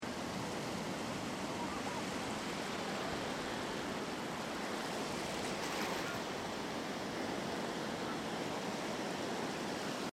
Ocean Beach Sound Of The Sea With People In The Background; CraftedSignals